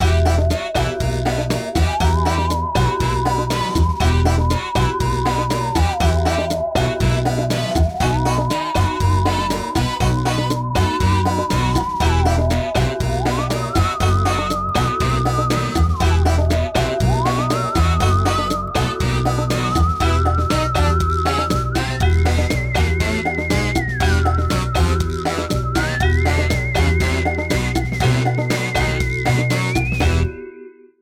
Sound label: Round 3 Choose Music; Jackbox Games; The Jackbox Party Pack 1; FIBBAGE XL